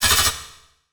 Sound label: Title Transition Blast; Production Element Title Transition